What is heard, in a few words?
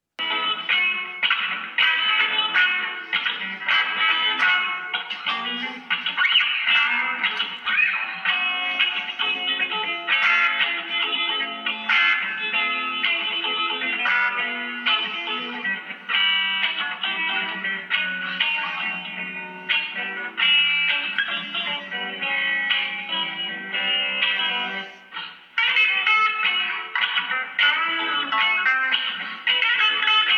funk